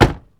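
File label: DOOR CLOSE, Car; Transportation; VSI Car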